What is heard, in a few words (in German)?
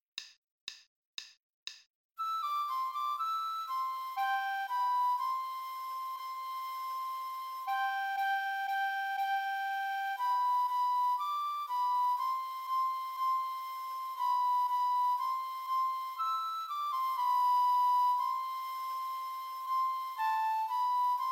Floete 2, Spielmannszug; Froehliche Weihnacht